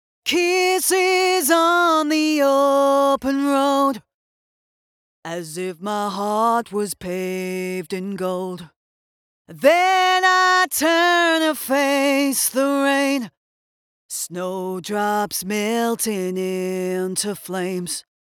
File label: Vocal Unprocessed